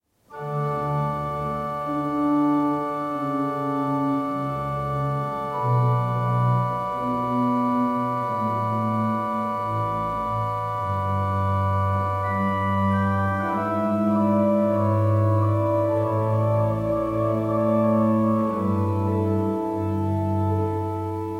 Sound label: Bach Aria 4 corda